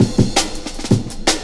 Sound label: Pride Cut, Drum Loops, 165.5, Jungle; Zero-G Jungle Warfare 1, 2 & 3